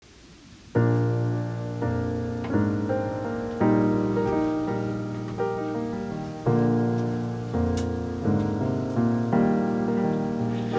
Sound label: sax